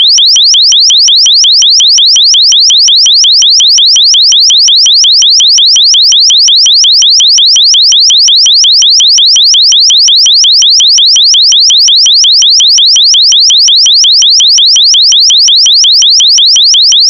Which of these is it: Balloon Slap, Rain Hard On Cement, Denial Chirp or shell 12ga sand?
Denial Chirp